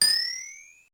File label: Queue level; LittleBigPlanet 2; PS3